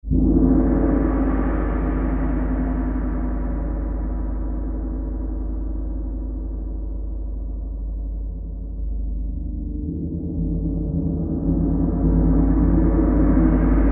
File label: Sabian 40 Chinese Gong